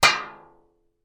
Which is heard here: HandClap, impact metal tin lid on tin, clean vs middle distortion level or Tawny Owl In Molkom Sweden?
impact metal tin lid on tin